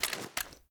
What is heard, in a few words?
reload empty end; gmod